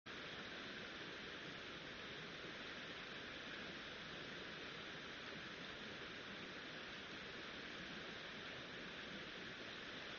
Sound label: riverside